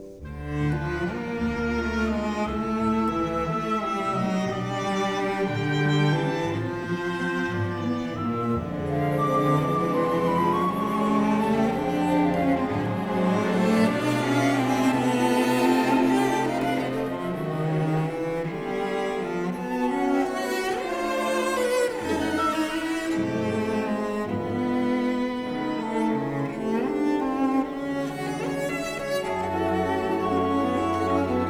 Cello Concerto IV. Con moto tranquillo

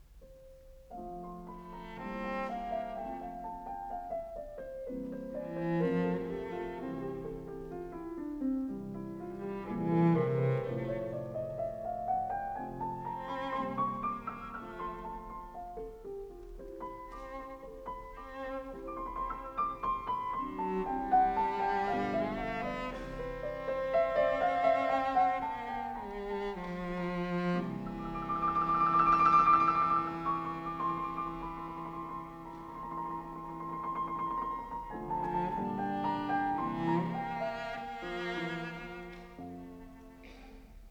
in F Major, Cello

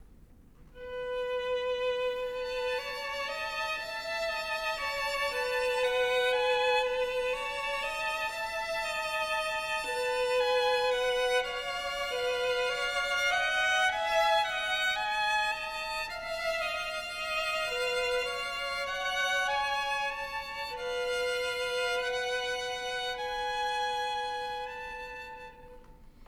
Duos for Two Violins, Hay Song

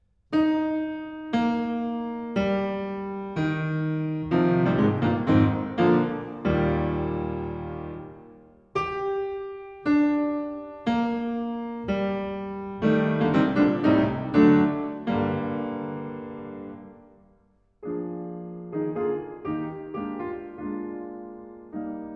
National Song, Piano; Lyric Pieces, Op. 12; Grieg

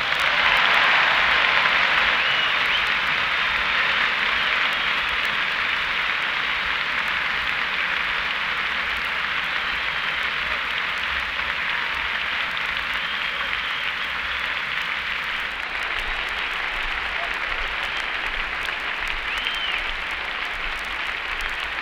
Applause; Setting Up & Tuning Up

